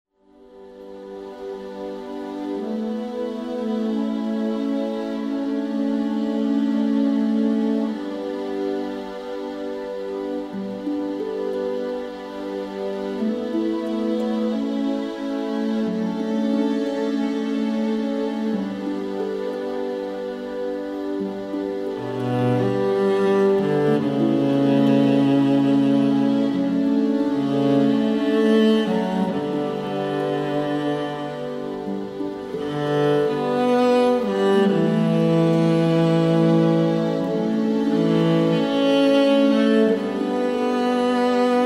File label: Instrumental Sad Music